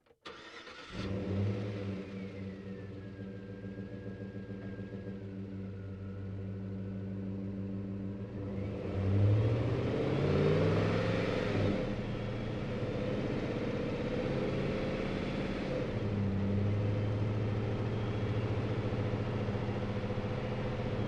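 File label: Truck GMC Savana Onboard Drive Accel Decel Medium; Trucks; Big Room Sound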